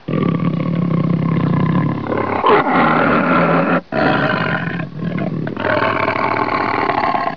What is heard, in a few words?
tiger long roar